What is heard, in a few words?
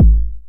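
909 BD tape, kick drum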